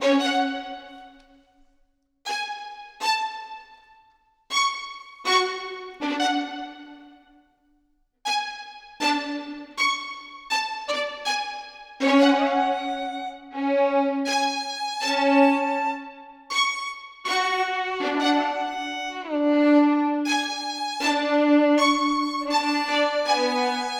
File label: trap violin loop